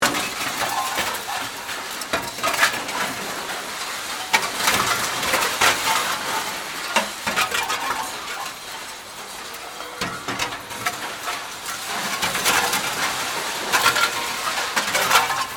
Factory Scrap Metal, Industry, Recycling, Center, Conveyor, Belt, Large, Loop; Scrap, General, Motors, Gm